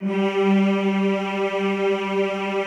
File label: G2, Classical Choir; Best Service; Extended Classical Choir